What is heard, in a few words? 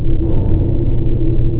industri